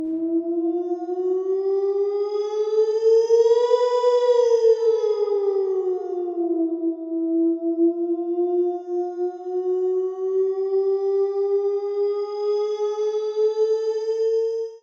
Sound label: siren